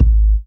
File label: BD hip, Dance Mega Drums; Best Service